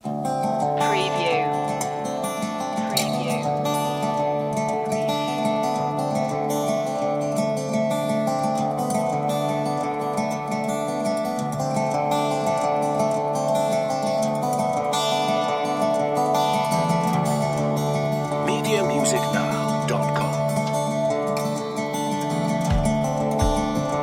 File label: mississippi walkin no lead no synths